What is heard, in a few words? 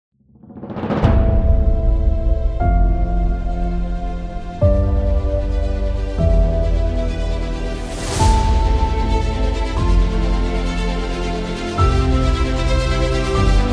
Epic Orchestral